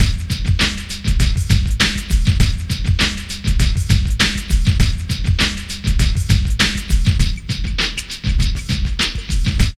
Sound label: PHAT LOOP; E-Lab; X Static Goldmine 2